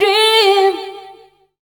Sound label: Dance Vocals, FEMALE